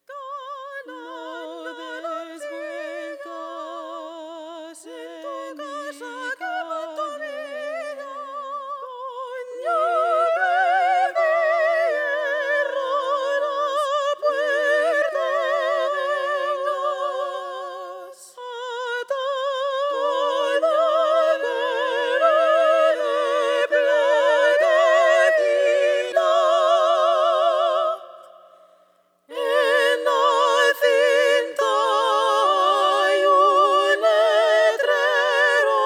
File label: Opera Galancillo, music orchestral